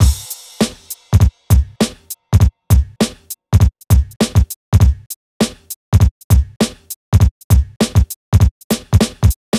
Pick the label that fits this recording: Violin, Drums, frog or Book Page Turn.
Drums